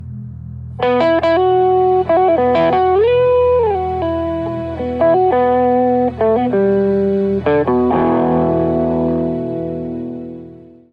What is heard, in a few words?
Riff